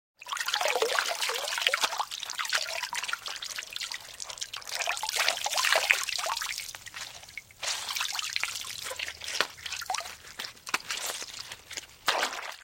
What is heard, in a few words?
Drips Water From Wringing Out Wet Cloth